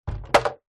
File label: foley wood large board drop ground